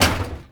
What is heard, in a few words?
Metal Crate Hit; Gameplay; Warframe; Tau; Destructible Dynamic